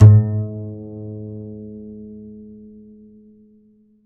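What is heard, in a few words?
double bass G#1; Univers Sons; Jazzistic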